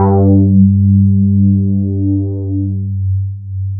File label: bass, synths